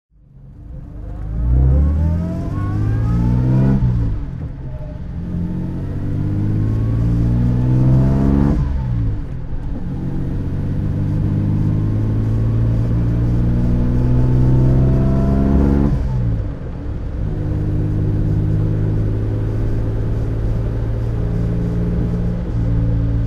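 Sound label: aston martin db2 vantage drive, engine sound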